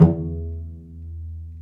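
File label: strings cello pizzicato, dry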